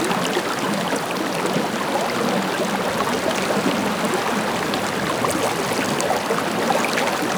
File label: Water Gentle Loop; Ambience; Warframe; Grineer Galleon